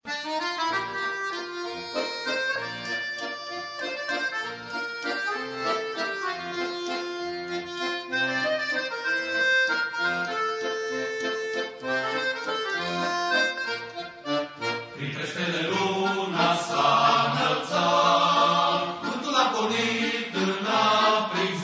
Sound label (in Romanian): vals